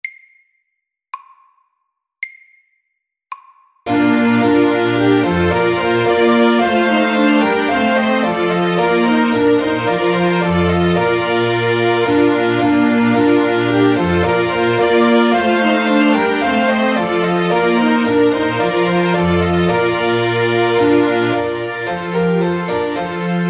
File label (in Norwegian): Trumpet tune SAKTE